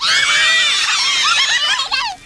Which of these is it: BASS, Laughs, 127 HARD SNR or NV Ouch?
Laughs